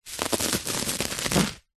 office packaging bubble wrap roll rub finger run through layers